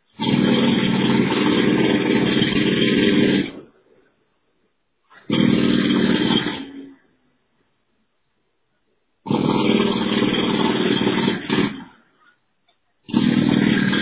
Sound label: Jackhammer Noise Through Voicemail; LinkNYC